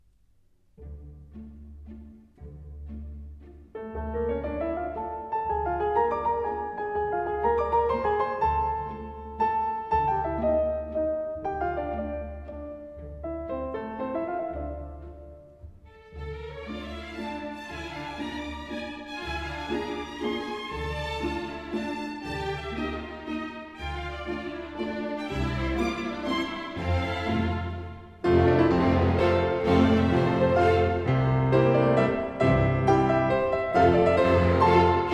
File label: Masurka, Piano and String Orchestra; Ole Olsen; Little Suite for Piano and String Orchestra; 1927